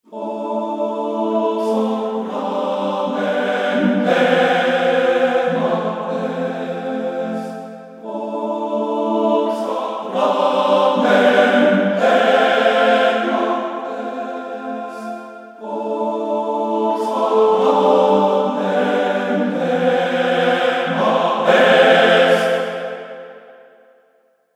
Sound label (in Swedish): musik